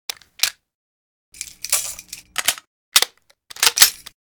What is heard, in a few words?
Browning Reload; Modern Weapons Pack